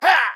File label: Damage; Pecheneg Red